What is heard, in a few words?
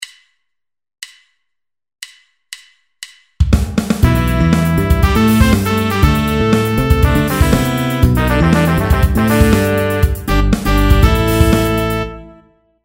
percussion